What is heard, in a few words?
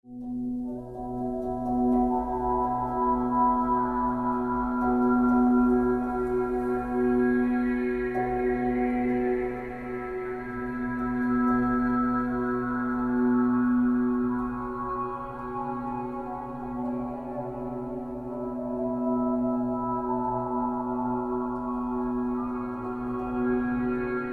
Kashmir Sunrise Loop